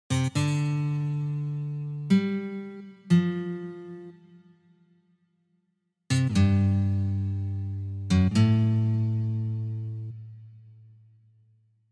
Uprazhnenie slajd na gitare

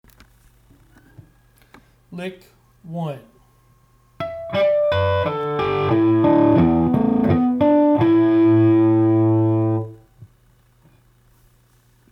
Naturalnye flazholety; uprazhneniya

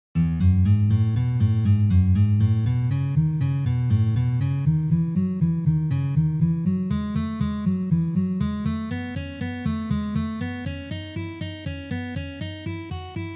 Uprazhnenie dlya igry gammy Mi mazhor

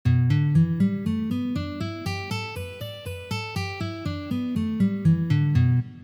Mazhornaya pentatonika

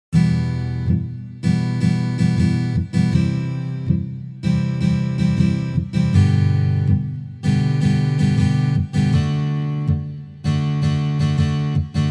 Boj Vosmerka s glusheniem

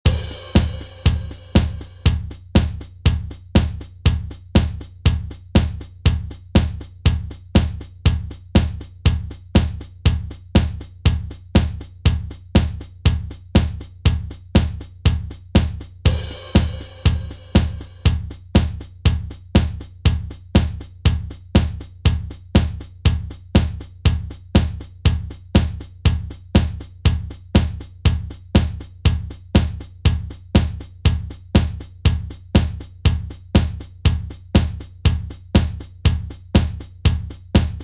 barabany; Postroenie-gitarnogo-akkompanementa

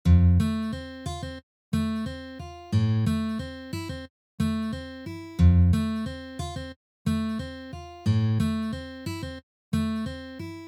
perebor pripev

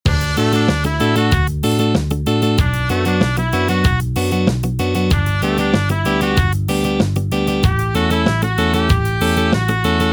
Ska pank

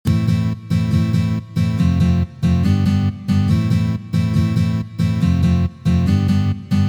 boj na gitare

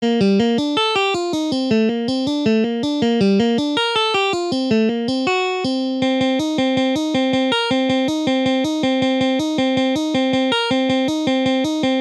proigrysh; taby

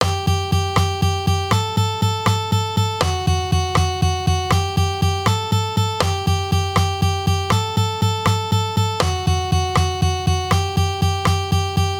Uprazhnenie pod metronom udara

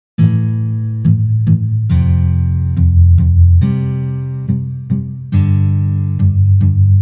Palm Myuting Palm Muting

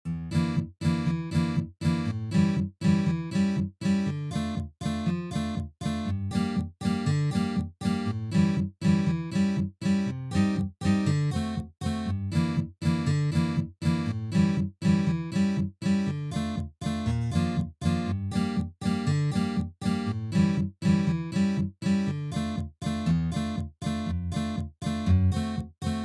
Boj

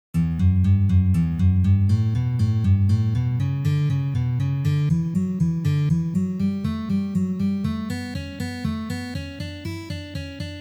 Uprazhnenie dlya igry gammy Re mazhor

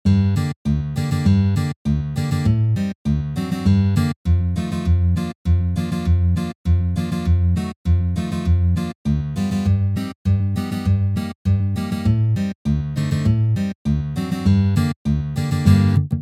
Boj

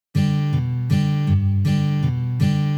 Bas udary po strunam

